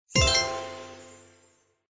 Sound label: Symbol High Win; Wealth Gods